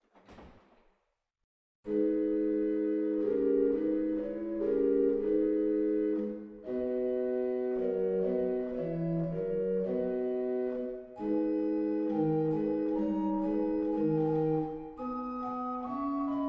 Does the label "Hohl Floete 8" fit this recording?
Yes